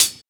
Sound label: low treble hi hat d key